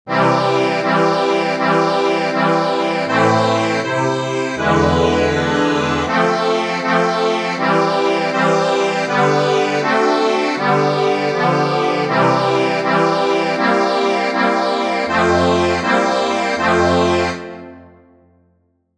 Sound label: accordion